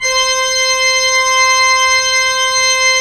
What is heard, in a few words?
ORG, CHURCH ORGAN; Roland - LA Composer vol. 1